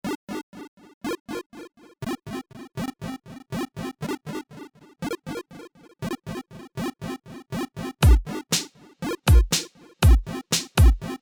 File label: short chip rock jam; weeklybeats-2016